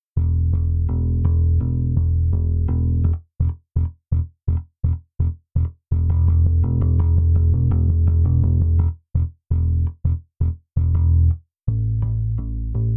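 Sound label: Violin Bass, default setting, finger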